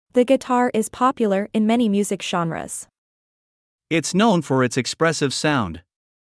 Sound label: Guitar